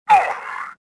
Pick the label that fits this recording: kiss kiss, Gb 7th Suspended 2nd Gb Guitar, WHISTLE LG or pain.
pain